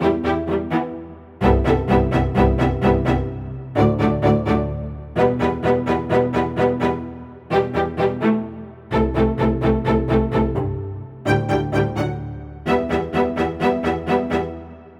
String Loop, 128 BPM E min; Instruments; Loops; House

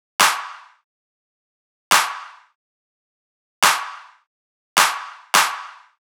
Riddim Drum Loop 140 Clap; Ghosthack; Riddim Essentials Volume 2; Full Drum Loops

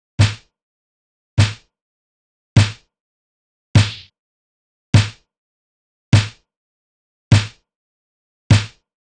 Drum Loop 101 BPM Snare; Loop Stems; Cymatics; DOPE DRUMS VOL. 1; Red Room

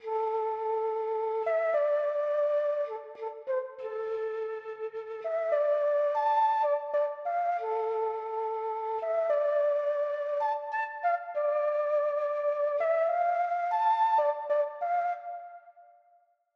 127 BPM D Min Flute; Cymatics; Cult

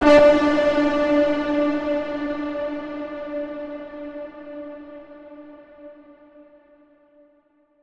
Impact; Hits

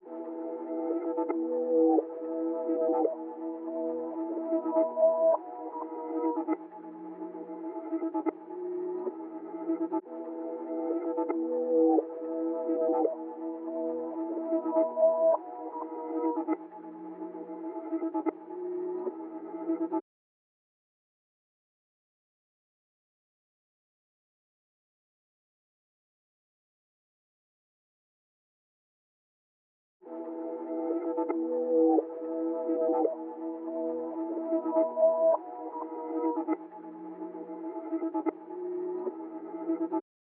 Atmosphere, 96 BPM E Min; Cymatics; Infinity Melody Collection; Avarice

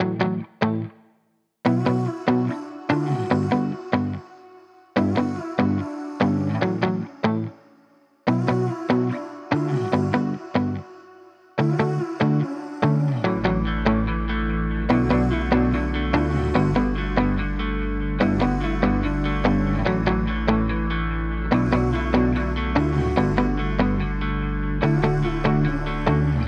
145 BPM D Maj, Guitar; Shooting Star; Cymatics

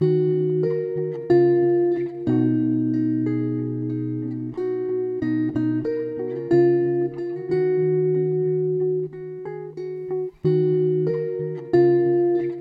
92 BPM D# Min, Guitar; Influence; Cymatics; Infinity Guitar Collection

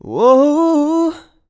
"Woohoo", Male Vocals, Ad-Libs - C Major 150BPM; Ghosthack - Free Male Vocals and Atmospheres

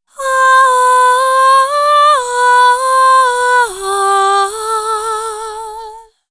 Vocals Am 65Bpm; Construction Kits; Ghosthack; SkyRealm; Kit 22 - Solace in Falkreath

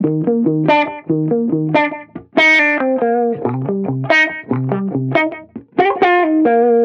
Reggae Guitar Loop 140 BPM D# Maj; Vibrations; Cymatics; Vibrations Guitar Sample Pack

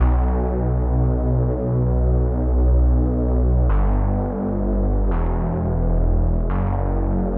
130 BPM B Min MemoryMoog Bass; Synth; Loops; Loop Stems; Cymatics; Fantasy Synth Sample Pack; Lights Out

